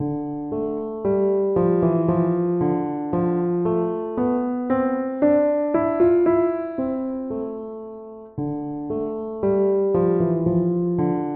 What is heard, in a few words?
dorian tune; Dorian Mode sonorities